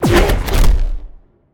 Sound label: combo dash; smite; god arthur